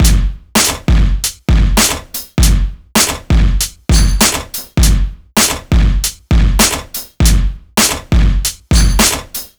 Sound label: 100 bpm breakbeat sample g key; drum loop